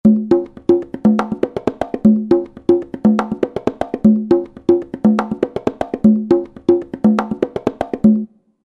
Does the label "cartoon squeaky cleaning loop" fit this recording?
No